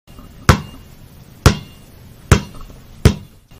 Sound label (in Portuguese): bola de basquete